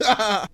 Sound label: laugh ha ha; Voice; ooh ahh